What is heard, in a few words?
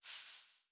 Campaign Battle; blue archive